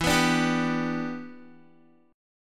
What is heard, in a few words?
E Minor 7th, down, Guitar; Em7; strummed